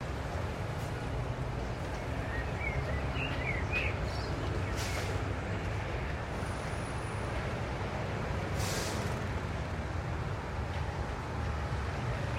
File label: Ambient - City Distant; Transformers The Game